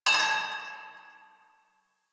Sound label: Pipe Cement Drop